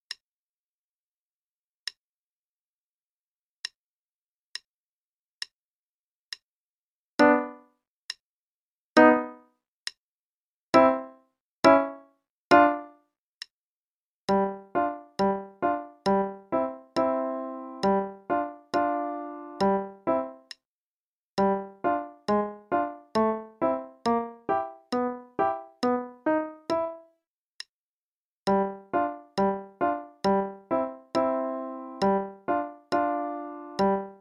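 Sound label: Play-a-long, left hand, quarter note=69; Beach; Pantalon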